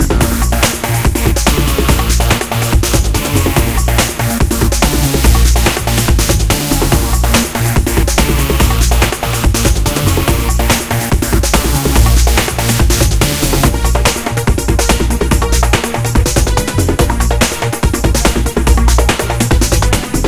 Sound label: Loop; pirate hunter windows gamerip 2009